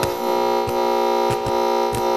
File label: broken lamp small loop